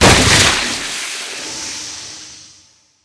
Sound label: Catapult Plague Barrel Impact; Spells